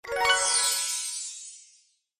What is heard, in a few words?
ui get reward